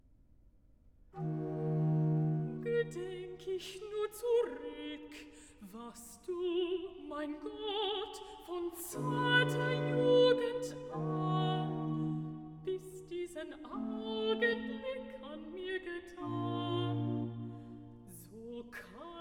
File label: Recitative Alto; Lobe den Herrn, meine Seele, BWV 69a; Gedenk ich nur zuruck; Bach; J S Bach; Cantatas, Vol 13